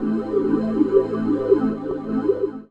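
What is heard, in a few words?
Pad Synth; Equipped Music; Loungin House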